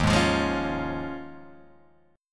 Eb 7th Suspended 2nd Suspended 4th Eb, Guitar Open G